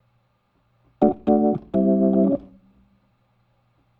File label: Organ, 70s Analog Funk